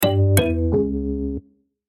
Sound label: fail